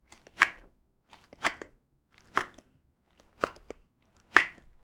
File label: walking with mug mug cli, everyday actions